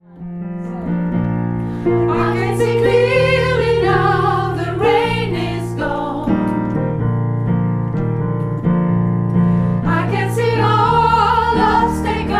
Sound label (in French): couplet soprano, gospel; couplet 3 & fin